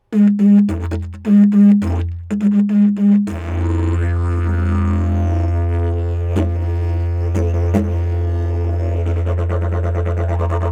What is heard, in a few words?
beehive, didges